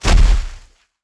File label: jump; GrandFantasia